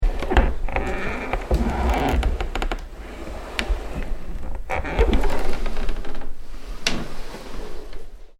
Heavy Creaking Wood Door; Creaky Doors; Sound Effects:Doors; CraftedSignals

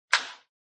Baseball Wood Hit

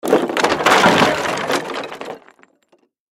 Crash Wood Small; Sound Effects:Materials; Blastwave

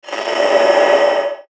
Static Swell Ring, Static Analog Hum Crackle; Production Element Imaging Element Accent Transition; Blastwave; Static Sound Effects

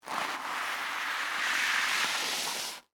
Scrape Crackers, Oyster, Debris; Texture; Scrape Sound Effects; Scrapes (Sound Effects:Objects); BlastWave

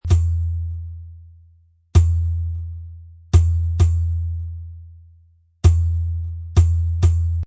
Low Hand Drum Beat 130

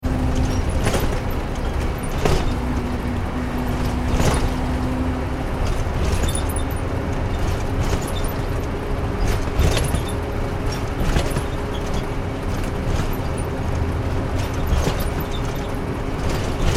transportation bus driving freeway internal; Bus Driving On Freeway - Internal Recording; Vehicles; McKinney Sound

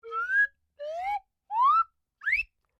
Whistle Slide Up Sequnce, Simple slide whistle; Musical

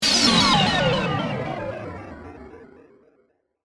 Laser Warble, Power, Down; Production Element, Imaging Element, Accent, Transition; Warble Sound Effects

